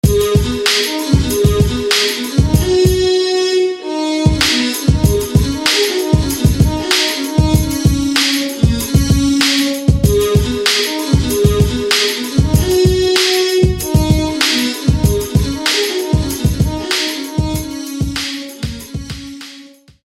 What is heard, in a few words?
Celtic Fiddler